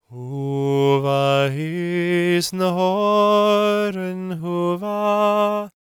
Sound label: GAELIC, World Voices; ILIO; Vocal Planet